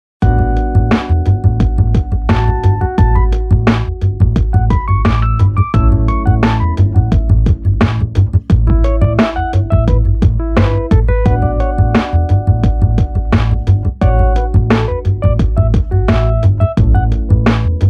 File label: SIMPLE BGM